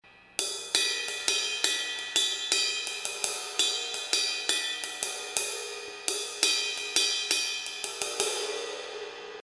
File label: Uptown Ride Bell Pattern, cymbal